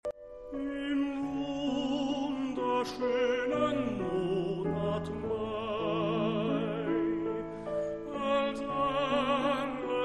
tenor

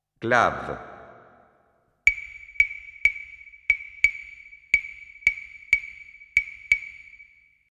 Claves

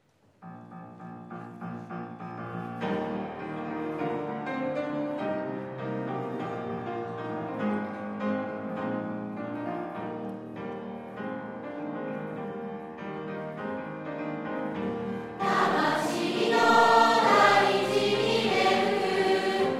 school song

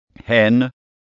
hen

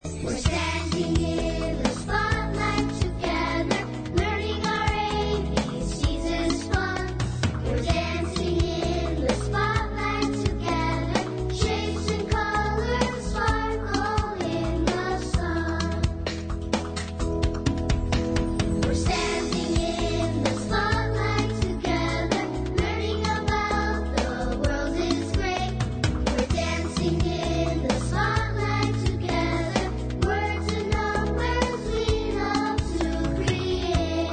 Spotlight Chorus

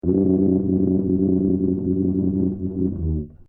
tuba

